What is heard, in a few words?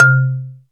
marimba; SN-R8-07 Mallet